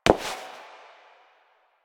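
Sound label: Step, footsteps; Warframe; Koumei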